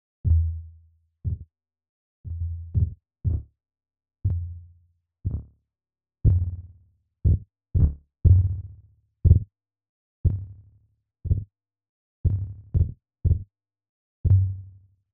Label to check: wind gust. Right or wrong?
Wrong